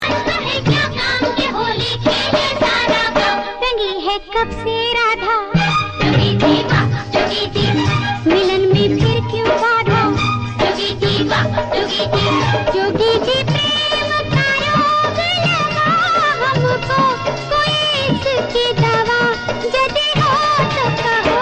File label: Holi song